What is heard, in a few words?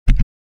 Radio volume control knob press